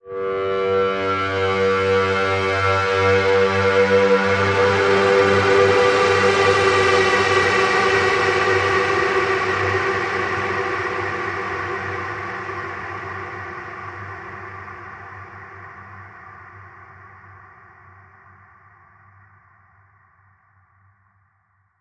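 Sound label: Bend; Spiked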